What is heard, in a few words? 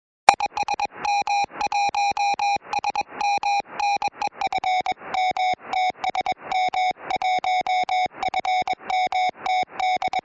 brum; ok1kze